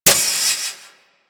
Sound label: Heat Gun Mech; Grineer